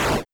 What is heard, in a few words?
hit monster